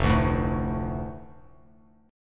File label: F 6th F Bass Standard; strummed; chord; JGuitar